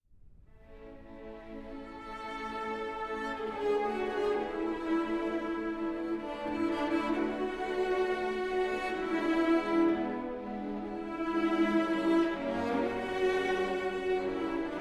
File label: String Quartet No.2 in D Major 3. Nocturne (Arr. For String Orchestra